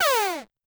attack

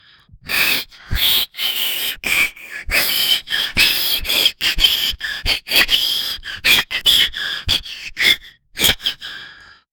fapping, sexual

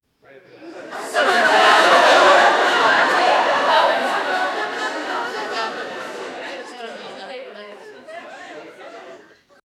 audience

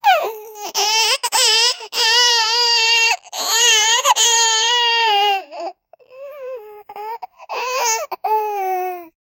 baby stuck and crying

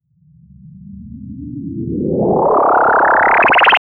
riser, affirmative

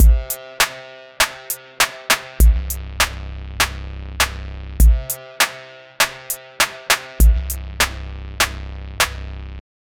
urban pulse drop trap; 8s

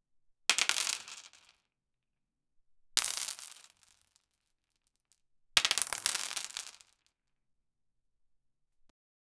falling a large amount of, crunch

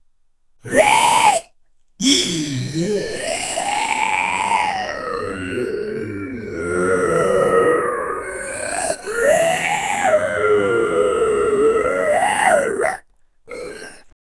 herd of zombies approachi

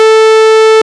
a race countdown tone

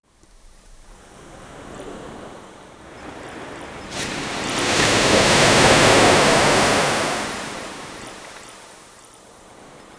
ocean waves crashing

